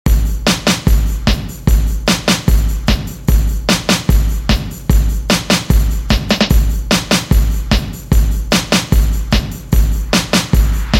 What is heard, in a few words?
60s Grid Redrum 149Bpm